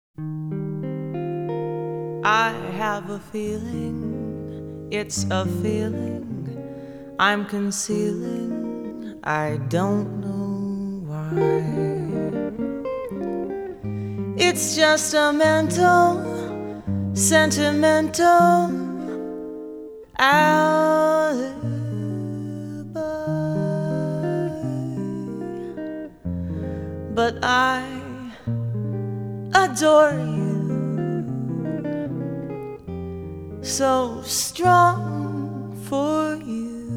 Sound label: Electric Guitar Jazz